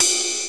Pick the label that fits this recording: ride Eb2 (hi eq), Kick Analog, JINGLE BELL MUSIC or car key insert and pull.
ride Eb2 (hi eq)